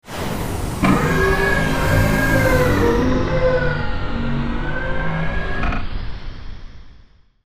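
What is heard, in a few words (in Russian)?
глушение двигателя космического корабля открытие дверей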